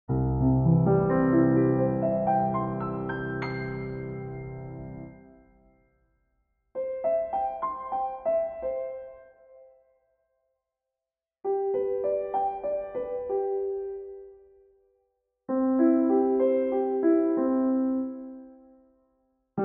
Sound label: Piano Hall 3 2s